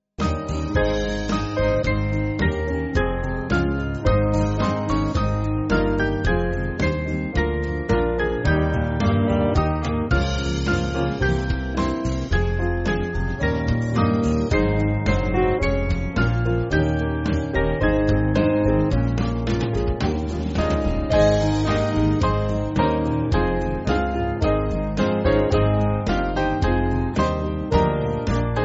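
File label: Band, Music Backing Files for Churches; Church Triumphant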